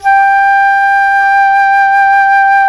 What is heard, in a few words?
Jazz Flute